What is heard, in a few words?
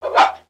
Pet Dog Small Alert; creatures; pets; Lego